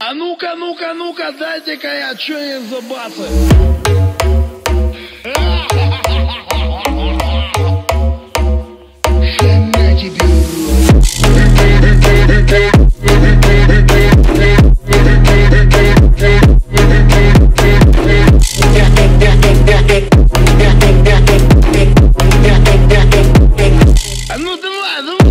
slavic phonk

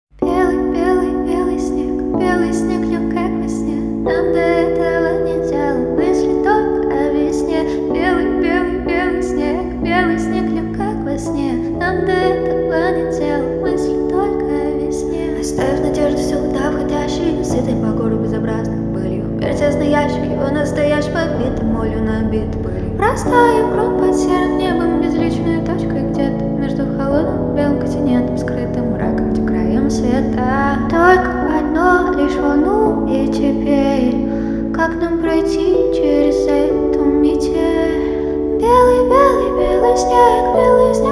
acoustic

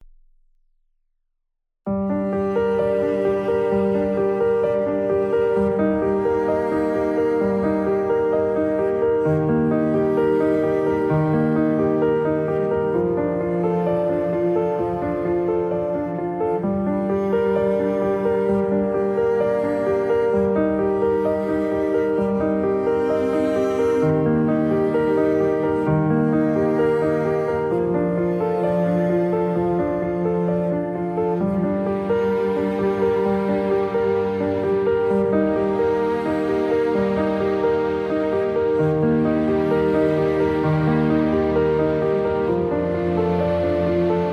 instrumental

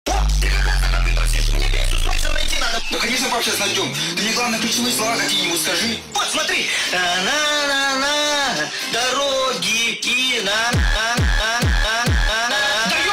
funk

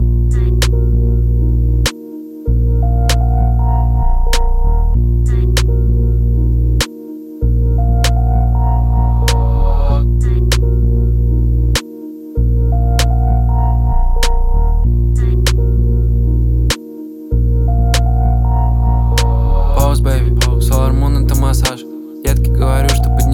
polka

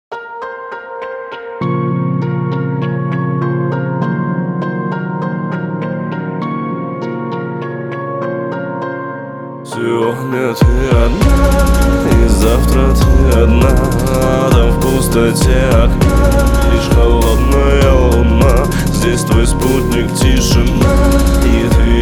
instrumental

